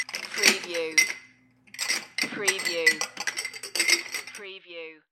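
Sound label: Stacking Glasses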